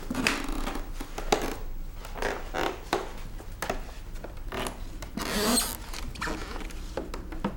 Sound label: Squeaking Steps